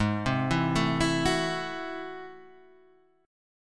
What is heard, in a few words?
A Minor Major 13th Ab